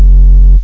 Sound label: FREQUENCY BASS